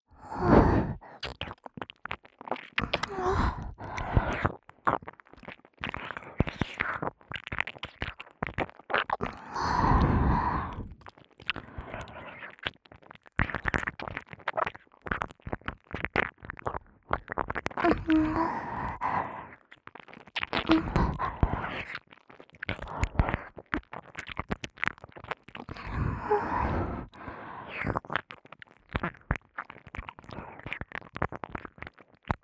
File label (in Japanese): (右)耳舐め 汁気多め; 耳の奥底で蠢いて～舐め音に溺れるバイノーラル～; 舐め音特化